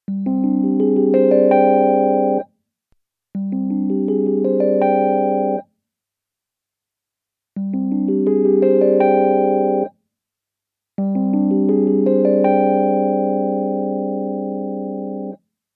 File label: Flanger Bypass Flanger FB pos FB neg